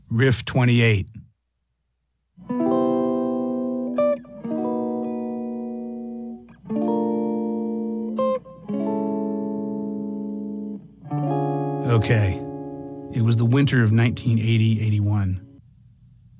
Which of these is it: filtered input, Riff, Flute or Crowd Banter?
Riff